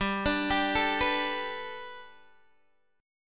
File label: G Major Add 9th Guitar DADGAD, chord